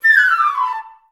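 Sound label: flute run down A4; Best Service; Peter Siedlaczek's Advanced Orchestra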